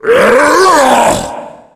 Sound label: tank throw, yell; voice; Left 4 Dead 2